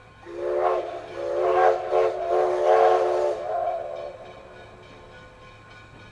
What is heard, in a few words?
rail; Mafie 1